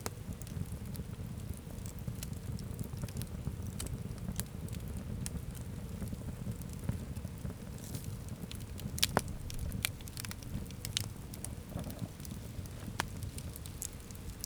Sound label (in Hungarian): tábortűz; vizhaz; cardioid